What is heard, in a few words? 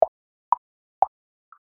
clicks; Comet